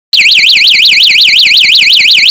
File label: siren; sensors